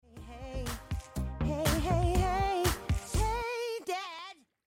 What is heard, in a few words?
random singing; american dad